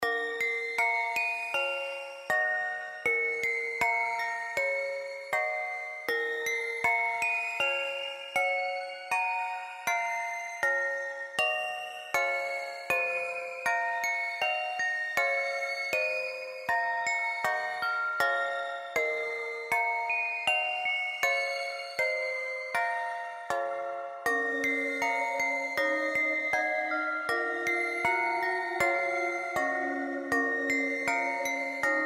XYLO DOWN BY THE SEA

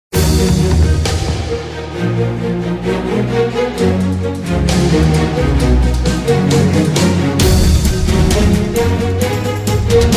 JINGLE EPIC

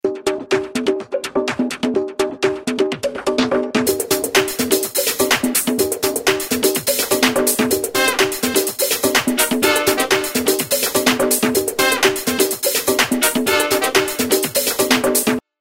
TRIBAL FUNK

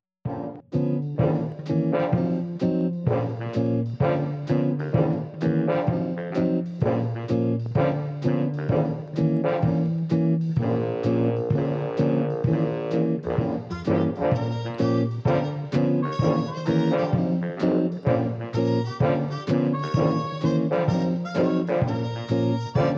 AMERICAN SWING FILTRE

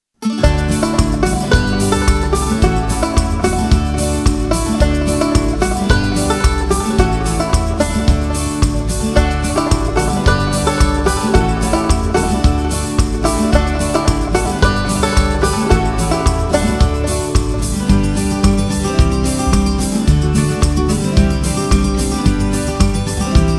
FOLK COUNTRYMAN

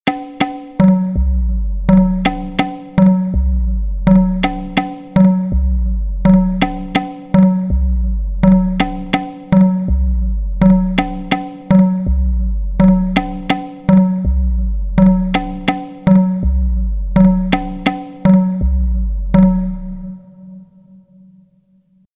METALO

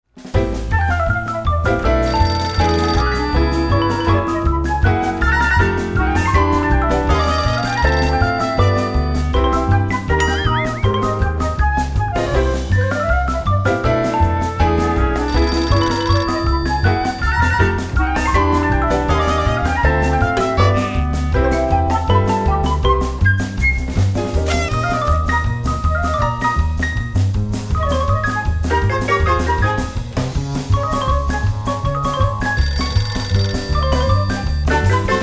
komic ballad

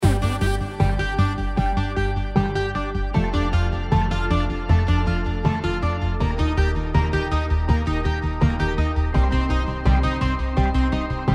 ELECTRONIK OPERA

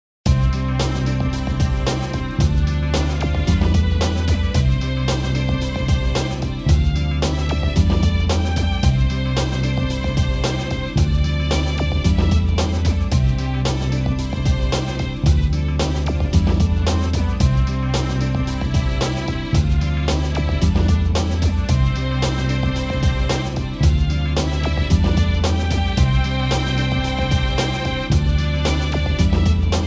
STRING GROOVE

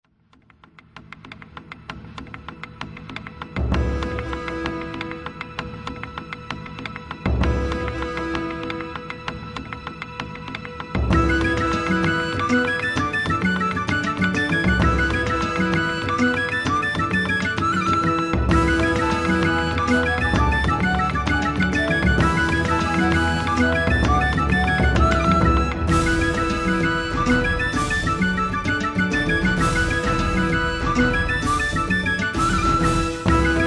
CELTIC STOMPING DANCE

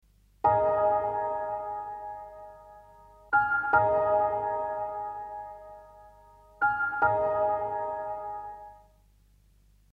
PIANO

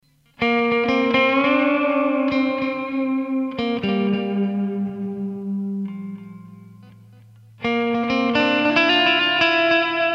GUITARE

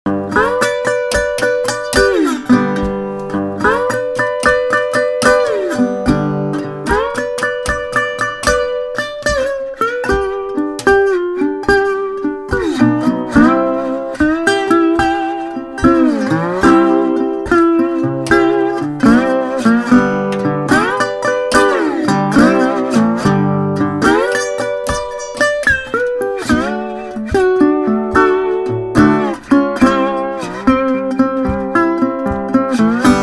DOBRO DELTA

